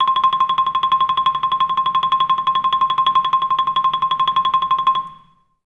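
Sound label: MR TREM C6, TREMOLO; Best Service; Peter Siedlaczek's Advanced Orchestra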